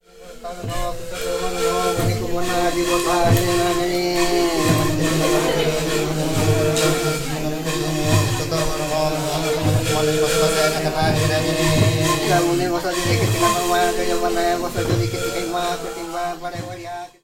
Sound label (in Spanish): Danza ceremonial Tribu Kabiyarí; jaguar voz de un territorio